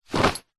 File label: clothing denim jeans drop carpet floor belt buckle